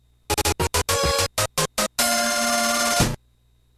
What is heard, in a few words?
Fanfare